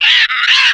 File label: cracker squawk; birdly